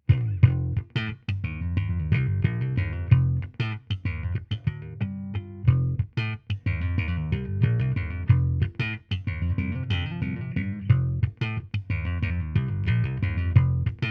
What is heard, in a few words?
Preci Neutral Slap